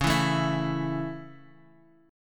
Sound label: C sharp Minor Sharp 5th C sharp down, C#m#5 chord; C#m#5 strummed; JGuitar